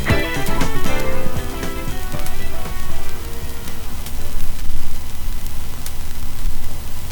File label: Amplified To Plus 4dB (Audio-Technica AT-LP120 Turntable)